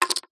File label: leisure poker chips x6 set down on wooden felt table